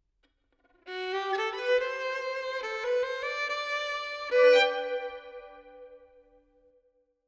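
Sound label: harmonium 3-4 70 verb; Sample Banks; 4-4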